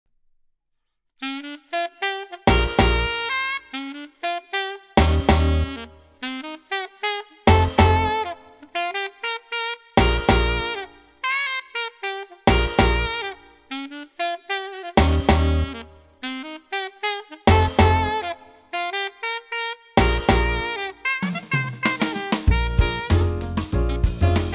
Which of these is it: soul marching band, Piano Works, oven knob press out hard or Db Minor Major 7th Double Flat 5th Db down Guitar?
soul marching band